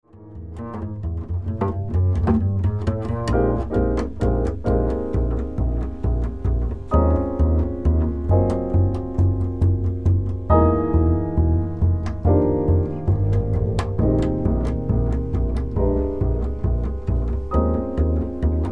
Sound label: minus Drums solo